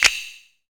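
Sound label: PER PROCU, Procussion, percussion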